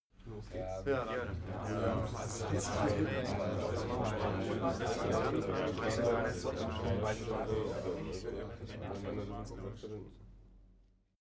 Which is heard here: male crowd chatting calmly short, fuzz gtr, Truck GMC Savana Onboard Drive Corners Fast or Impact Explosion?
male crowd chatting calmly short